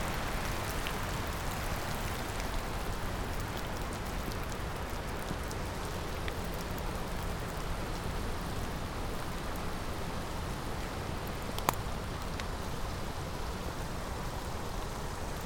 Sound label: Storm in oregon rainforest